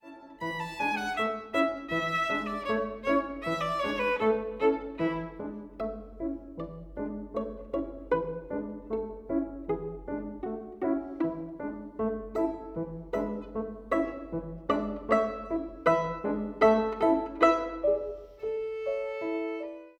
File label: VIOLIN